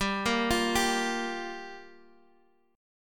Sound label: G Minor 7th, Guitar, Half Step Down